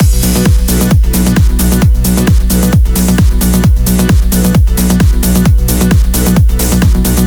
132BPM Elemental Mix

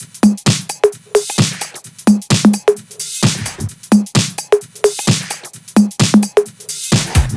130BPM Jig Kit; Vengeance Samples; DK Jig Kit

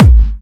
Bassdrums Clubby Kicks; Vengeance Samples

